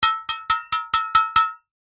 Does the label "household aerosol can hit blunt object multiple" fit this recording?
Yes